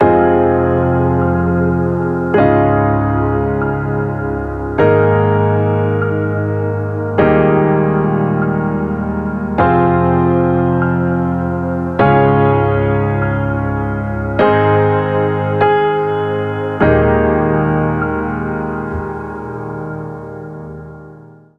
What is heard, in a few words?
Emotional Chords AbMaj(FMin) 97 BPM; ultimate vibes midi pack 2